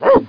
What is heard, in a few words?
bark h, dogz